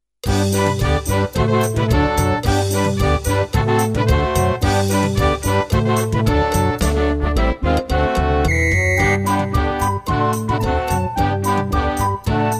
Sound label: RECORDER SAMBA (blokfluit)